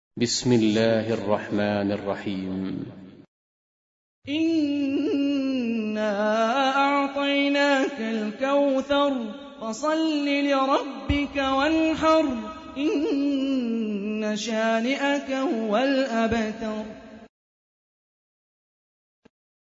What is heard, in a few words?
Quran; Hani Refaey